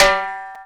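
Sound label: timbale hit; Sounds Good - Roots Reggae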